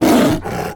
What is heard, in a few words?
roar